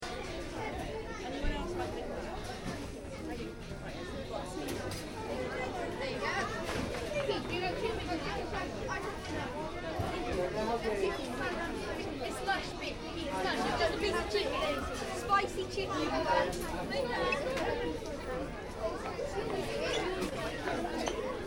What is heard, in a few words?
Interior burger restaurant, Burger Restaurant: Interior, Ambience; Restaurants